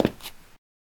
stone

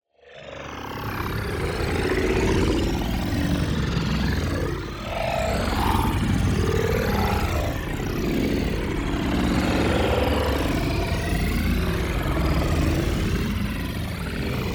PARTICLES Grain Womb; boom library; Matter

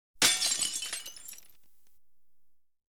Audience Applause

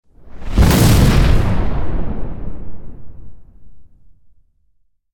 Explosion Heavy Scatter Destruction; Hollywood Edge; sound designer's toolkit v2

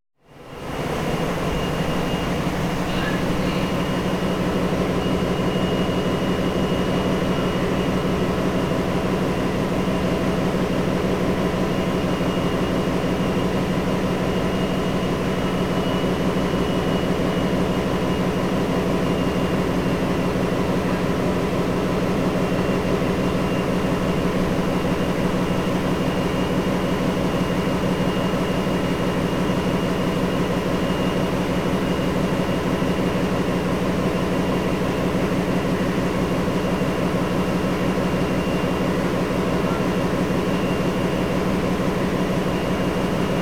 Boat Engine Room; KMP Film Video Sound Effects

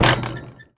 Street Ball Glass Rim; SFX Kit; Street Basketball

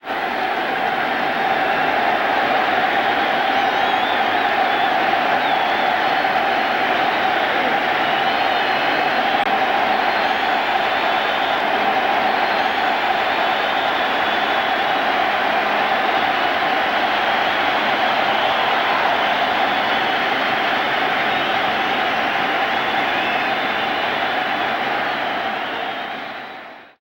Baseball Crowd Cheer; Crowds

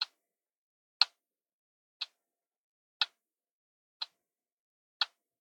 watch; devices accessories utilities; NS Studios - ultra sound collection

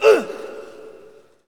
Hockey Male Hurt; SFX Kit; Hockey Vocal Sounds

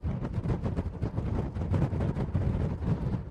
wind; Blind Drive

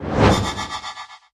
Stun; Kilta

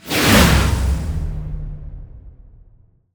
Ice Spell Mobility Teleportation Glacial Glide; Mobility & Teleportations; Arctic Aura

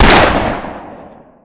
Gun Pistol Shot; SFX Kit; Weapons

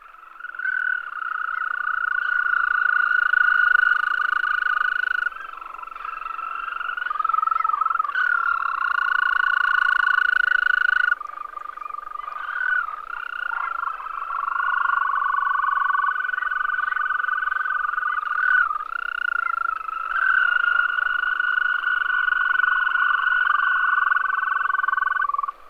swamp

